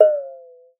water; Xsample VOL-9

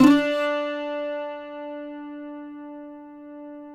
cuatro D; Spectrasonics; Hans Zimmer Guitars VOL-2

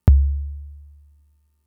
Kick, Drum Machines Fricke MFB-522

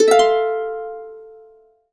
harp chords, F maj7; USB Soundscan vol.62; Complete Harps; AKAI

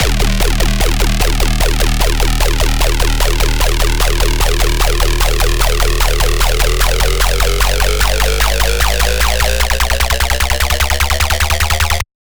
bass loop 8 bar Acid Riser 150 D#; Virtual Riot

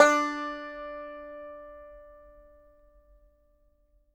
banjo D4, banjo notes; Sampleheads - Whole Lotta Country 1 & 2

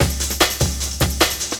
LOOP, Drum & Bass, 150 BPM

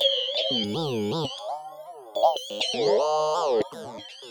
Alien Chatter, sci-fi

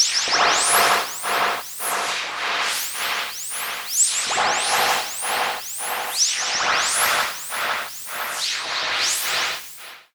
Filtered Noise, radio signals

